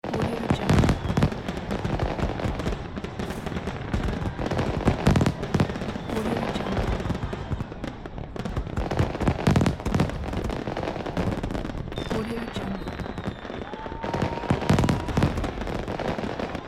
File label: fireworks celebration